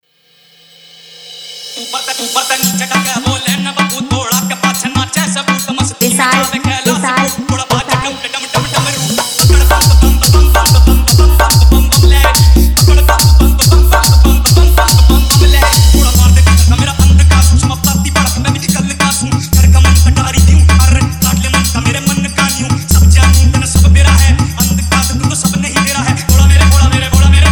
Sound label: BolBam Dj Remix Song